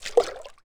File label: grenade water